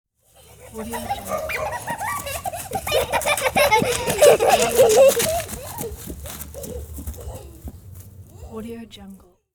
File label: children laughing running outside; بازی و خنده بچه ها; human sounds